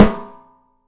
OPEN PICOLO, 3D Drums

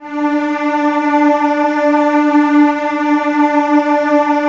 Virtuoso Strings, L; ILIO; Kirk Hunter Virtuoso Strings VOL-2; 90 s Sample CDs

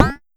Pollard Perc, Syndrum

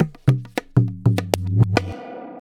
Tabla, 100 BPM; Pure Tabla; Zero-G

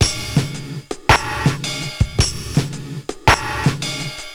80s Gated Verb Korg MR-16 (110BPM), cyborg beats; musicradar

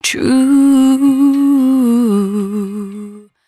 113bpm C#, Velvet Vocal; Zero-G

